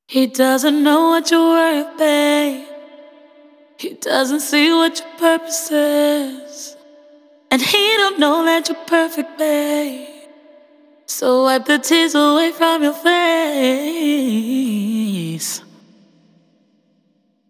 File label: Female Vocal